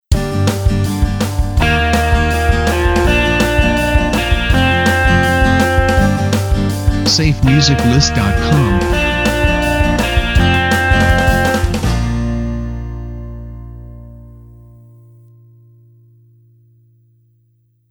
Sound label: Motivational Video Intro; SafeMusicList